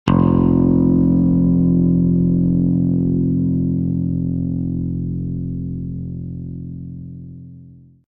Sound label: f bass note guitar string